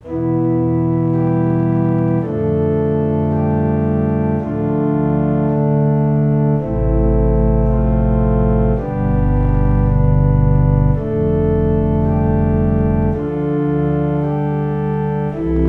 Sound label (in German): Stimmiger Contrapunkt; Ronge